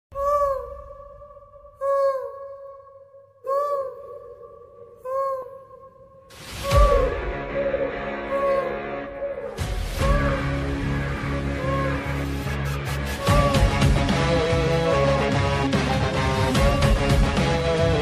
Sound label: bgm ringtones telugu movies bgm; mathu vadalara